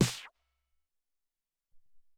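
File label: Dan Snare 5, Alesis SR-18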